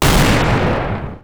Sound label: grenade launcher fire; weapons